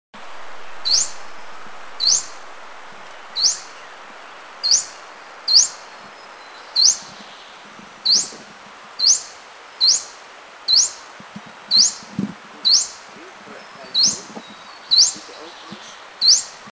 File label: Grey-cheeked Warbler Call; Eaglenest; India